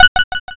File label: toggle on